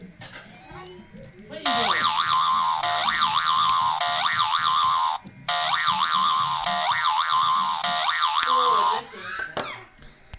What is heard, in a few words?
boing